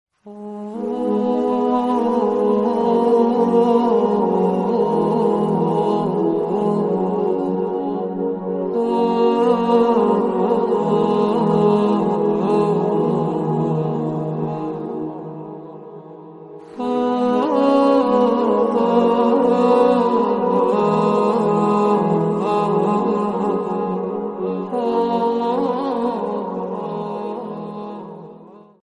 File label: islamic sad bgm ringtone arabic ringtone sad turkish ringtone